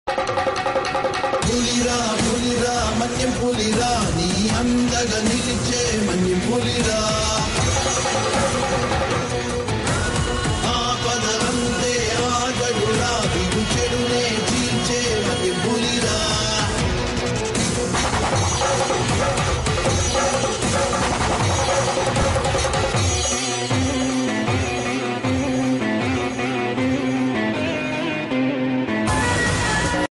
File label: telugu song